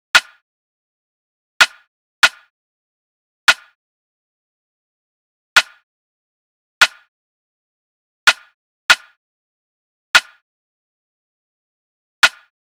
Drum Loop, 144 BPM Rim, Drill; Loop Stems; Cymatics; GHOST (Beta Pack); Arrived Drum Loop

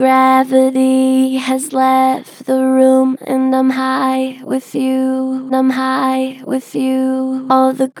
Vocals, Pitch Flat Gentle; Gravity has left the room; Harmor Vocals

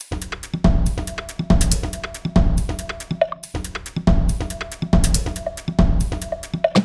Perc Loop 140 Implode; Drums; Percussions; Loops; Ghosthack; Drum Mega Bundle; Abstract Percussions Volume 4

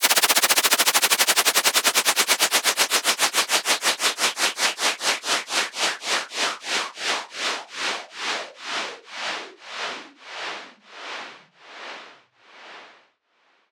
Downlifter Waves; One-Shots; SFX; Ghosthack; Color Bass Essentials

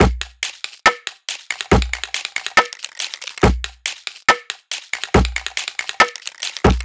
Organic Percussion Loop - 140 BPM; Dubstep; Drums; Loops - Top

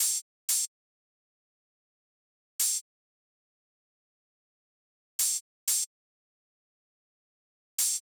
Drum Loop 185 BPM Open Hihat; Drum Loops; Loop Stems; Cymatics; Mystery Pack Vol 4; Stomp It Drum Loop

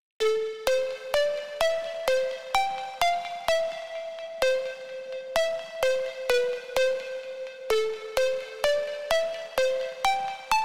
128 BPM A Min Lead; Loop Stems; Cymatics; Paradise; Breakdown Loops

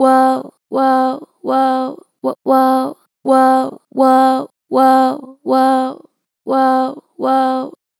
Woah, Natural Vibrato Strong, Vocals; Harmor Vocals

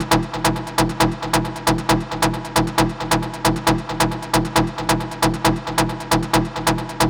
Synth Loop E 135, Techno

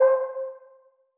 PLUCK Hallway - C, Synth One Shots

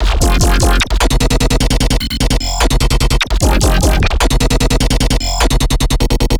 Melodic Bass Loop F 150, Color Bass; Loops; Ghosthack - Color Bass Essentials

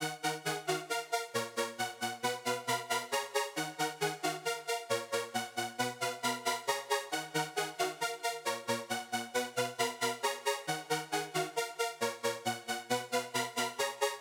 135 BPM D# Min Synth Layer, Loop Stems; Melody; Cymatics; 2022 Melody USB Expansion; Serotonin

